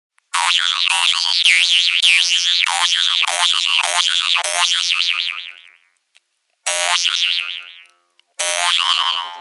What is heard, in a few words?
Guimbarde; RingTones